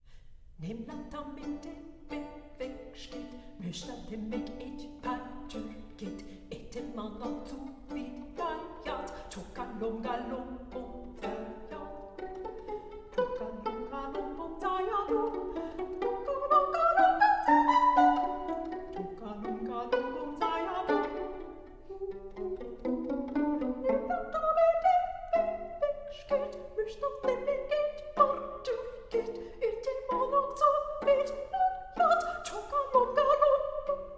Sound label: duos for 2 violins pizzicato